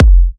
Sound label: Kicks F; markus hakala